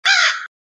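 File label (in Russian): ворона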